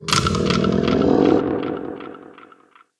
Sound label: SPELLS Raise dead